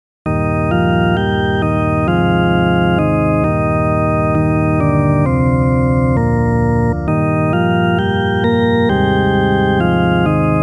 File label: solo organ, SANCTUS; ANGELIS